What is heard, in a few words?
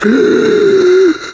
DYING; BattleSNDS